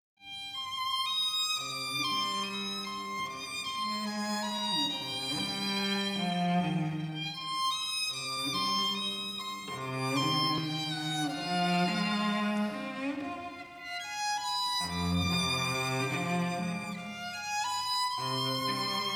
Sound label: Duet of Violin and Cello